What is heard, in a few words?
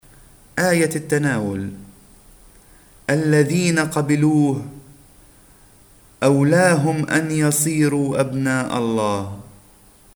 Communion Antiphon; 2 Sunday after Christmas